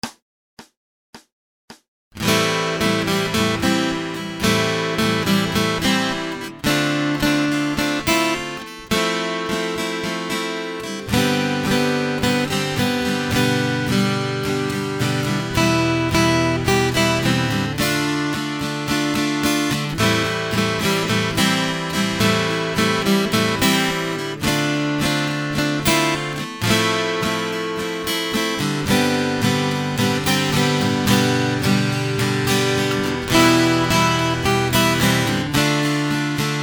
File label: Acoustic Accompaniment, acoustic guitar